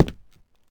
tile, Footsteps

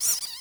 mouse squeak; Animals

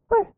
muffled weh, toys

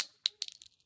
casing fall, Guns

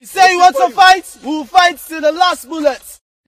charge, Voices Emotes

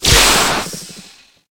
alien spit acid; Weapons; Xeno

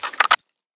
bullet insert

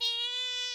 fox; Animals; civ13; civ14

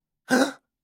man gasp

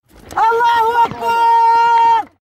charge, Voices Emotes

